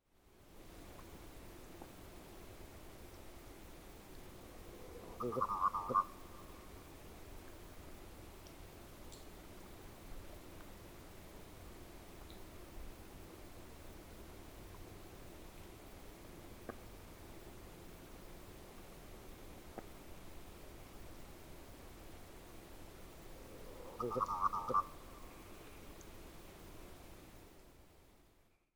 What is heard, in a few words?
merg; The voice of a merganser. Is is a Hooded or a Common? Clatsop County Oregon.; Microphone: Rode NT1-A(x2)